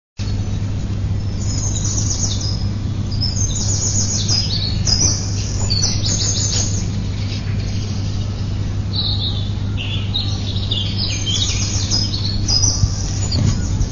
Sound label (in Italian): uccello